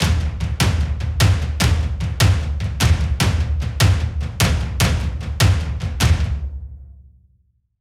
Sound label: Cinematic Drums Dry